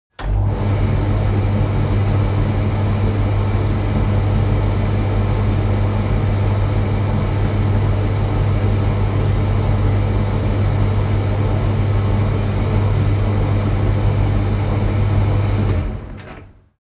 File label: washing machine tumble drier work